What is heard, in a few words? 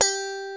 GTR FNK GTR, Clean E.Gtr, Brite Strat; Roland L-CD701; Clean E.Gtr5; Brite Strat1